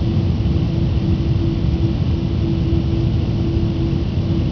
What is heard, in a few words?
ice; stormtrain